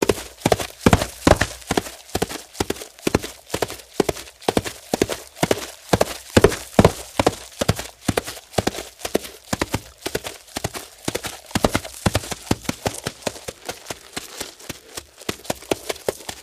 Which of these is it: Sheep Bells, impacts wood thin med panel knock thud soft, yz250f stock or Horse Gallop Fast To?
Horse Gallop Fast To